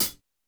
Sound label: hihat; Reference Beat BPM-130; Pophits